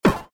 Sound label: noize